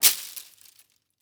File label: Impact Tree Branch Whip Ground; Impacts